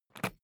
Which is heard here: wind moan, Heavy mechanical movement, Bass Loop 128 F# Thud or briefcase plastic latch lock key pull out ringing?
briefcase plastic latch lock key pull out ringing